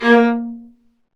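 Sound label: Marcato, Strings; Vlas Marcato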